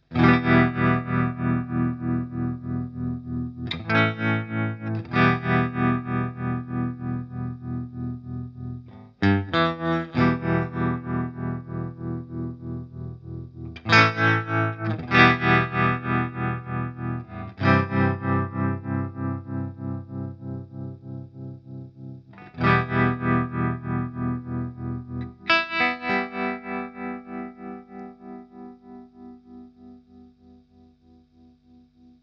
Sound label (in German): Walrus Audio Monument sinus